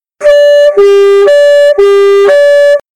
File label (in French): son 2 trompes abs tgv